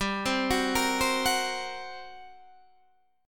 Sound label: G Minor Major 7th Double Flat 5th, G, Guitar, DADGAD